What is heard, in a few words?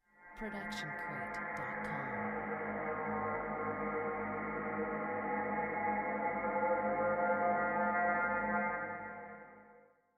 Bending Voice; SoundsCrate